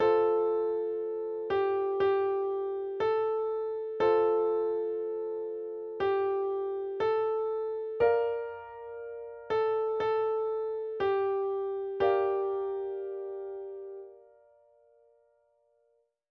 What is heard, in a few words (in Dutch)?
Voorspel Verwachten